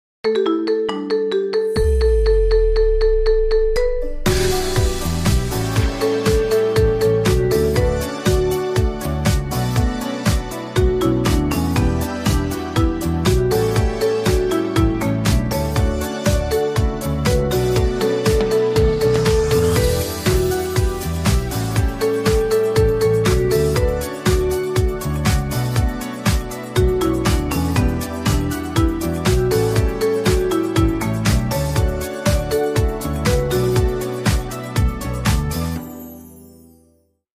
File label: Marimba Remix